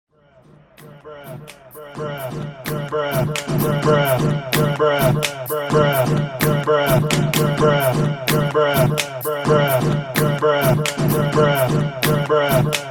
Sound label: beat